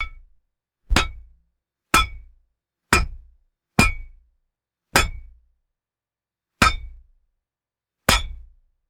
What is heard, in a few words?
heavy metal striking thick glass repeatedly